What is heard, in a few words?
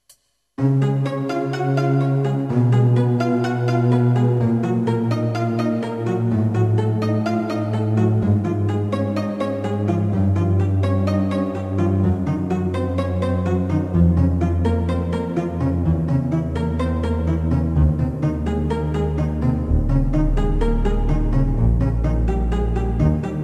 Kromatik Midi Keyboard; Hjemmelavet Fra Gamle Kasettebaand